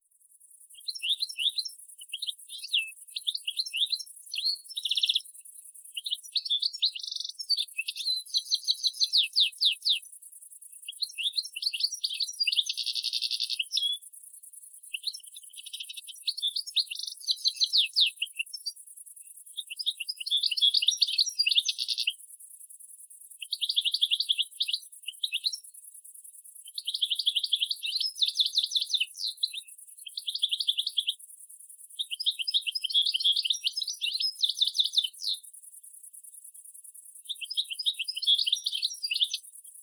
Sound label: Carduelis carduelis; SETE